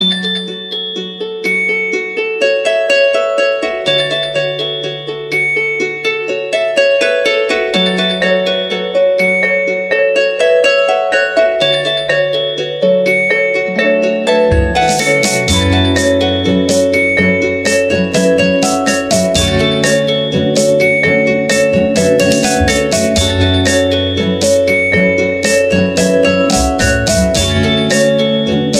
Whatsapp Redmi 7S

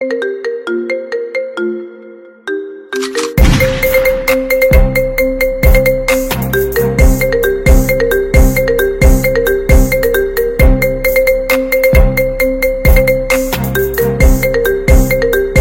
iPhone Opening Marimba Remix

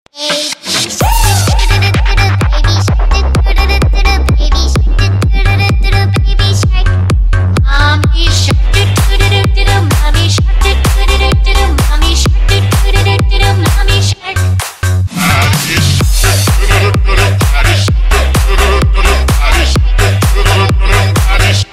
dooremix

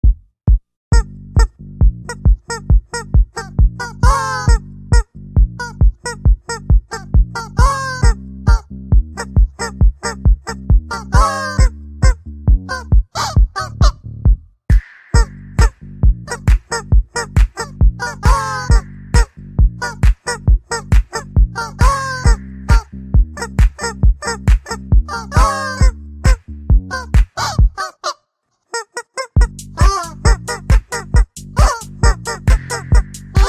Bongo Cat

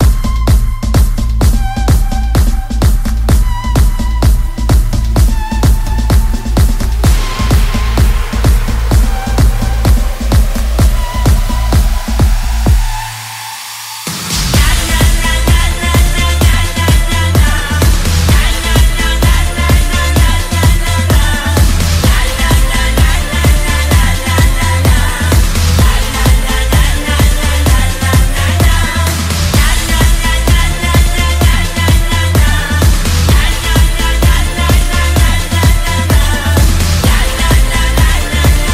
Clown Soprano

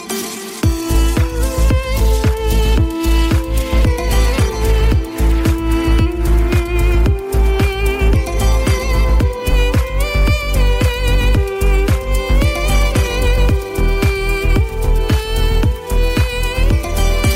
huawei p30 pro

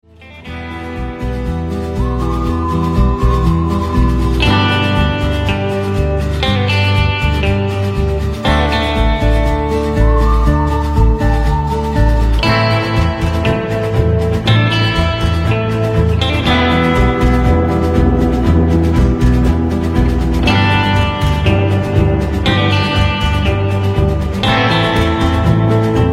Guitar Cover

